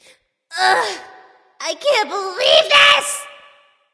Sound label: boo hoo; smite; jingwei